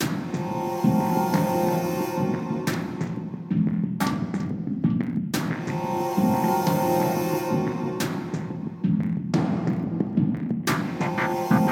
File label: Lava; Bomb Chicken